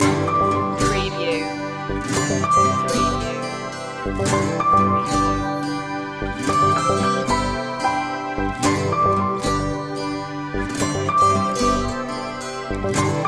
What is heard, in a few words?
acoustica loop